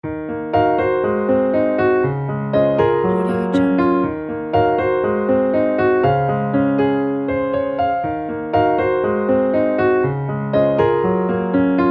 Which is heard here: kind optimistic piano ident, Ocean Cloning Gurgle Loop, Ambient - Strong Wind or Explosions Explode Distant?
kind optimistic piano ident